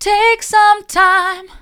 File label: take some time mid, vocal